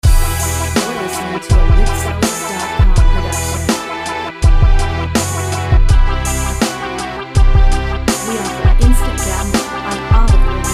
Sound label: Hip Hop Loops